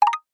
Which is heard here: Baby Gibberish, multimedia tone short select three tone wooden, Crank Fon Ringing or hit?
multimedia tone short select three tone wooden